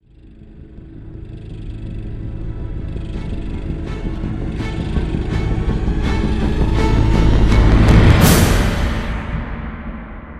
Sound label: swell build full; transformers war for cybertron gamerip